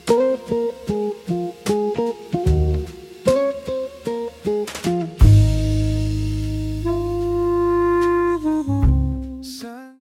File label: chill ambient